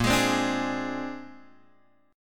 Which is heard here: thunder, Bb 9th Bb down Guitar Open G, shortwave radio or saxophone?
Bb 9th Bb down Guitar Open G